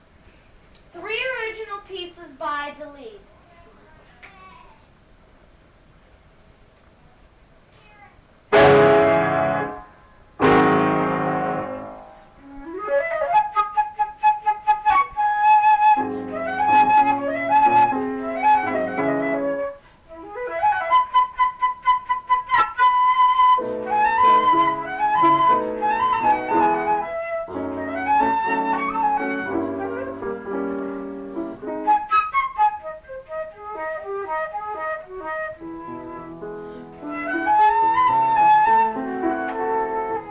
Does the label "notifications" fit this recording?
No